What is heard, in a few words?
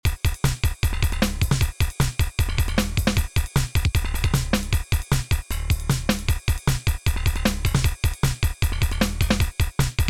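bass and drum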